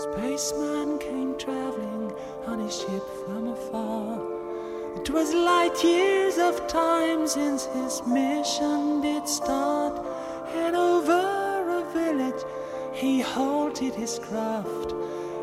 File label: hats of glass